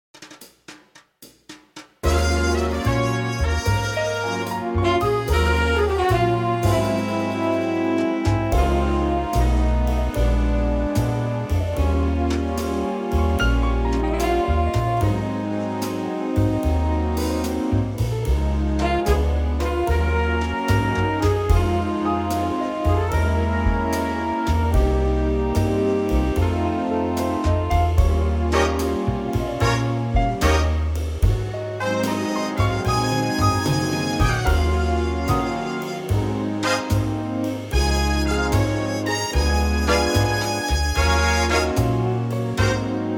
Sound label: big band Db